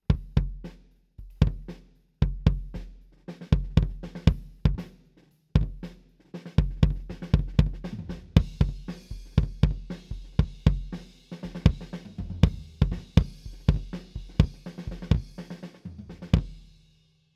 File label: kick head off mid md421